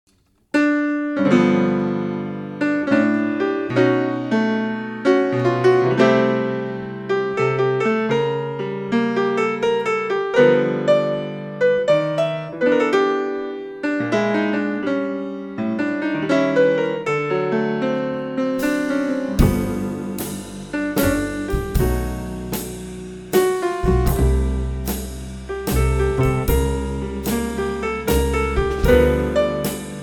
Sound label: Gospel Funk